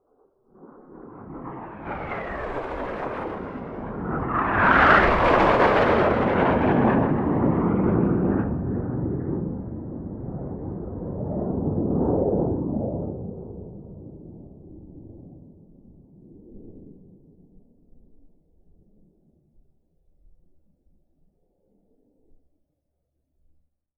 aircraft f 5 by series of 2 high distant and very close f 5 flybys medium to distant